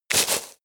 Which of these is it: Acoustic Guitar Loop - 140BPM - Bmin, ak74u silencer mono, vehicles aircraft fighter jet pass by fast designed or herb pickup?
herb pickup